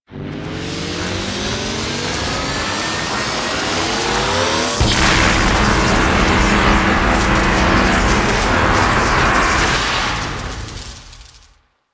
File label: Orokin Engine Blast; Ambience; Props; Warframe